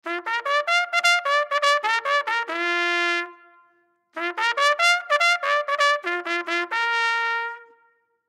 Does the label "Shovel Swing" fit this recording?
No